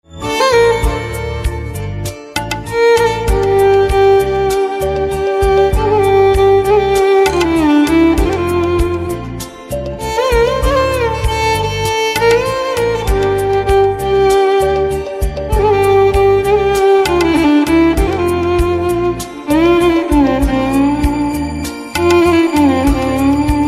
Violin Instrumental Ringtone

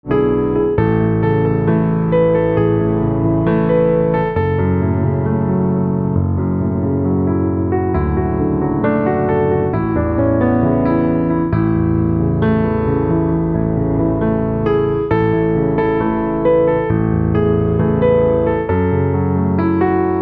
Piano Silent; instrumental ringtones

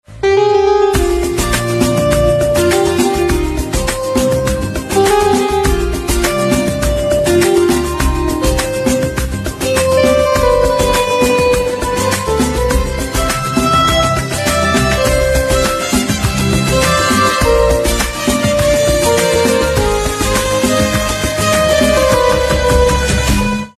Piano Instrumental Ringtone

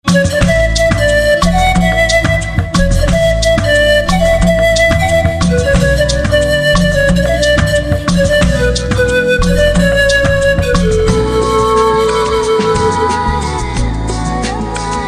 Flute, instrumental ringtones

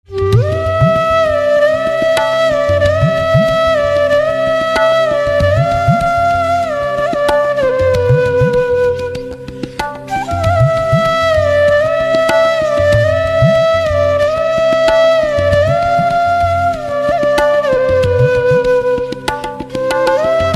Flute Slow

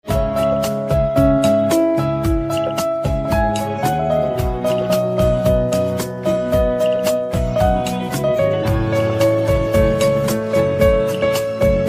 Piano, instrumental ringtones

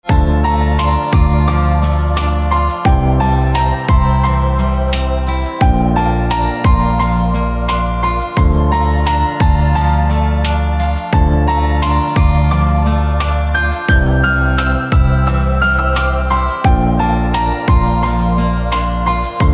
Piano

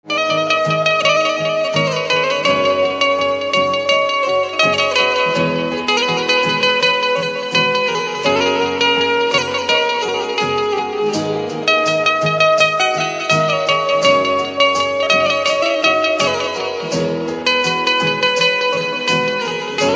Banjo

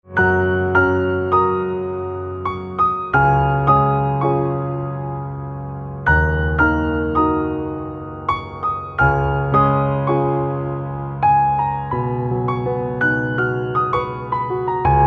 Piano

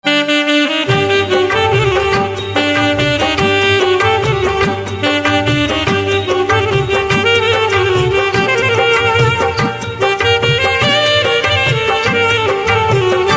Saxophone

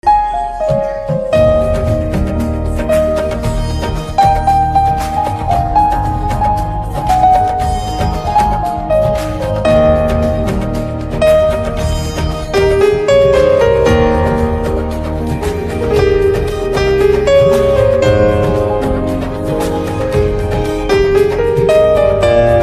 Piano instrumental Ringtone

